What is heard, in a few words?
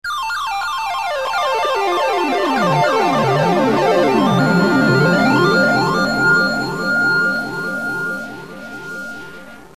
jingle